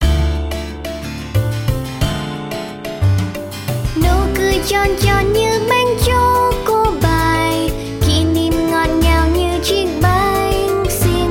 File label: chocopie song